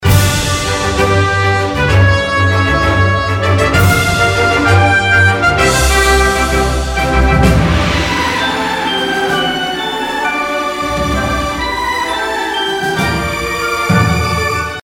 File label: big win loop; netent